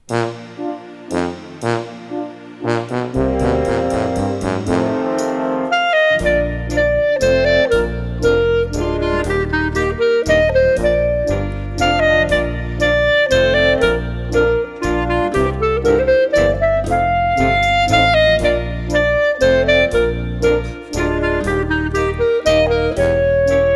Clarinet

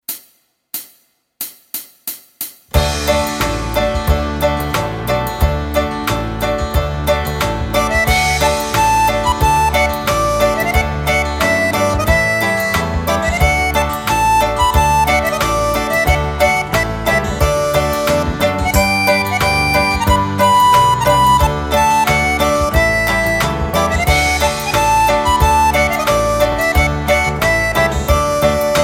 O Susanna, ukulele

